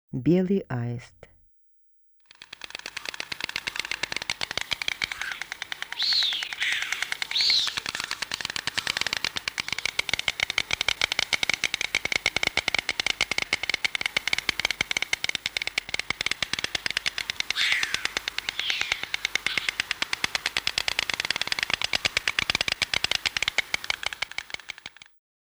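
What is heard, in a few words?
white stork voice; aist belyy golos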